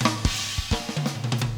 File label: Fill; DARKMIND